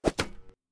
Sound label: Arrow Impact